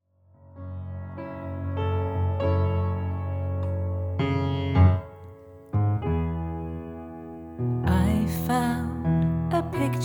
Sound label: vocal booster